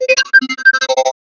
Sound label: ai powered robot lively